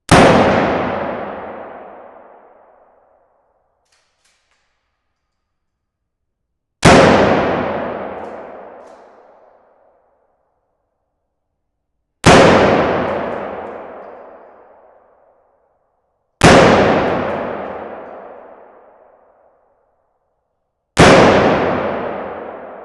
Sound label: medium warehouse remington 870 12g pump action shotgun 10m single shot; x8; audiobeast; sonniss gdc